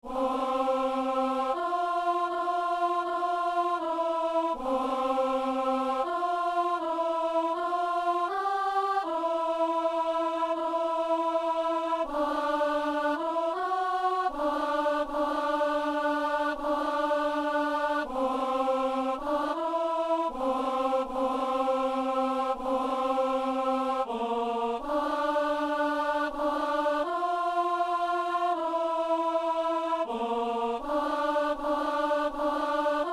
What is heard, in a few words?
alto